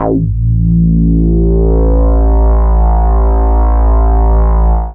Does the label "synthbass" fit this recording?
Yes